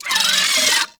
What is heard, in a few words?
Arachnoid Micro Vocal Attack; Warframe